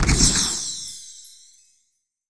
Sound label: pc Pick X3; netent